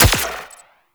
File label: Turret Fire; Warframe; Liset; Gyro